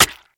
bone break stab

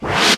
sneer, Zaps and Beeps

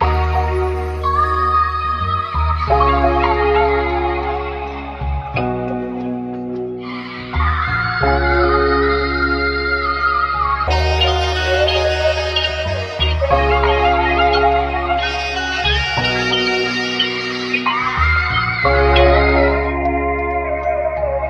keys voice sax, loops, phonky

